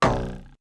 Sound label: Bow Twang